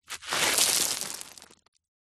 foley stones kick mound roll down

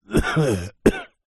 human male man cough; zapsplat

